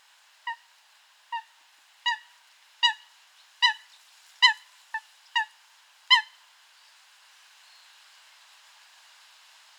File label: Fulica atra; RNN-Estuaire-Seine